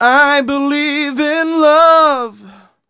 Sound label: m 127 mel a, Vocals